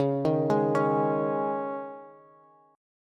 Db Suspended 2nd, Bass; arpeggiated; Dbsus2; JGuitar

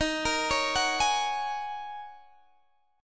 Eb Minor 11th Eb down Guitar Open G

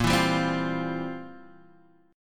F Major 7th Suspended 2nd Suspended 4th

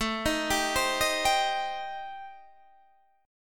A-Minor 7th Flat 5th, Guitar DADGAD, chord

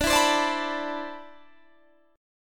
D 13th Suspended 2nd D

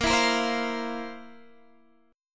F Major 7th Suspended 4th Bb

